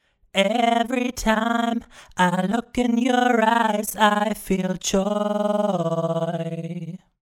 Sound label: Vocals Bubbling Lead Vox